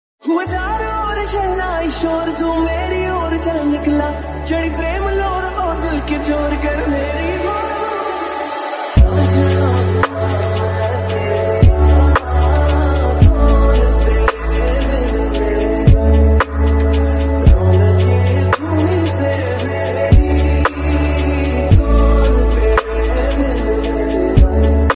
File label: lofi ringtone lofi